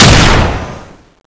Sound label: wing gun shoot; zombie rush